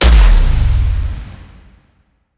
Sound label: flashback